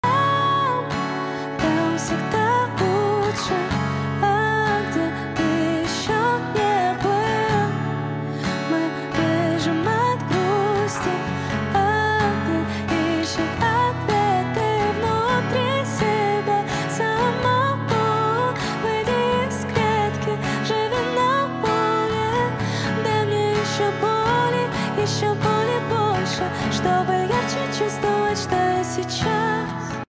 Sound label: Intro Acoustic